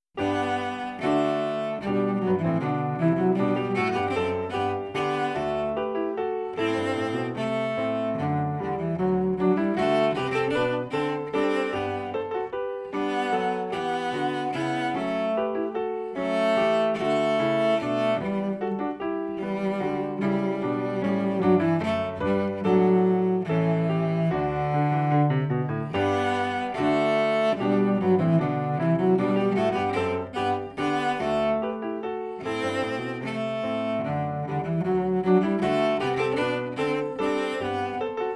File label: March In G, cello; Suzuki Cello Vol 2